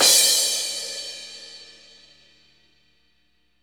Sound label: cymbal crash, Roland; Northstar; Drumscapes Roland; Cymbals 2